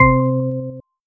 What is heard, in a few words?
spin, slots